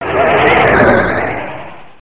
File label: Shot; hydra castle labyrinth gamerip 2011